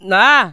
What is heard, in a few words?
NA HA, Funky Vocals; USB Soundscan vol.18